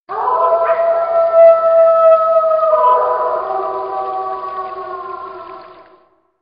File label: wolf howl